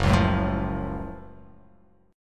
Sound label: Gb Minor Major 7th Gb down; strummed; JGuitar